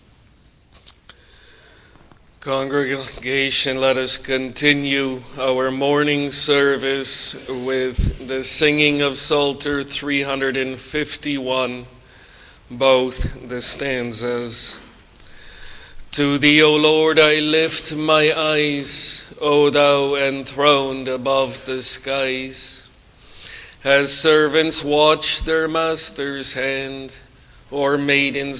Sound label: Singing; Jesus' Mercy to One Taken with Palsy (2)